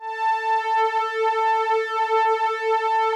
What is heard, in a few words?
OBERHEIMS